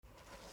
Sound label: Book Open